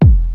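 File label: 909 House; DRUMS + PERC; OTHER DRUMS; Rubber Chicken Software Sample Bank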